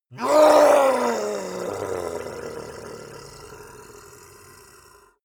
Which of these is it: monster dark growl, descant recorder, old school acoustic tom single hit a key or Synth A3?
monster dark growl